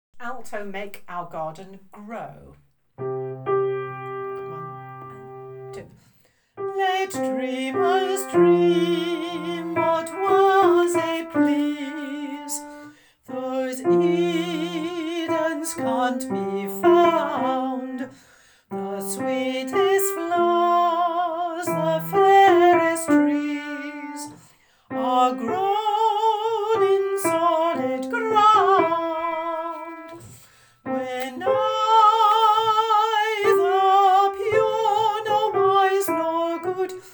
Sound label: alto